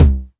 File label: Low Tom